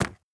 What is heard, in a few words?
stag footstep wood hard; Rust